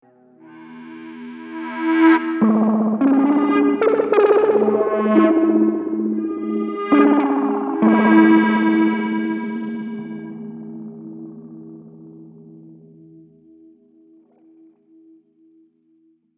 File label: Melody; Hylics